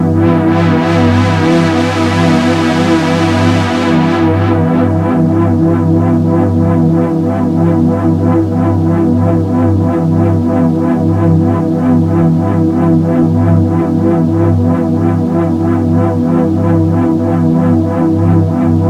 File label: DNB ATMOSPHERE Em